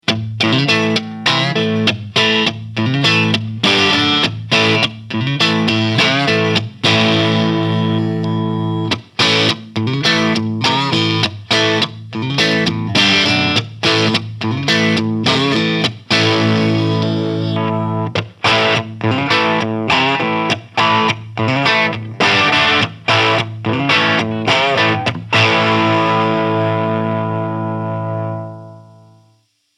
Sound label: Framus Idolmaker 5R Light Crunch